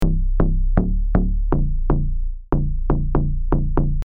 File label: Bass Deep House; Midi; deep house bundle